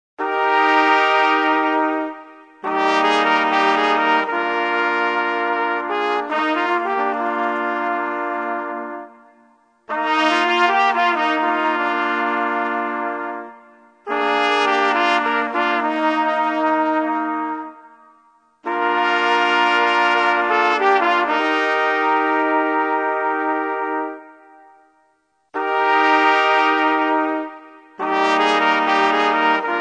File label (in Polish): hejnal